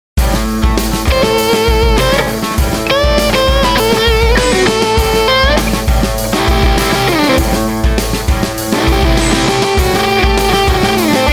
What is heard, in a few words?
Honk Machine; Francois Delfin